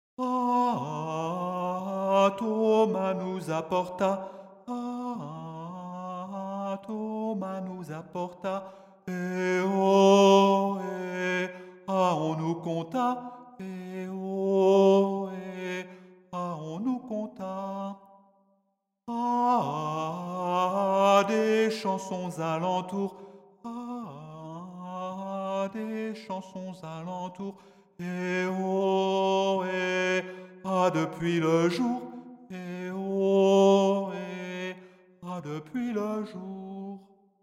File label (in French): noel alto